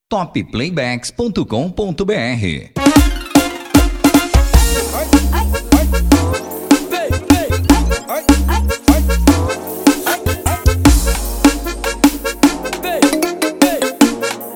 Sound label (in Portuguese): Forro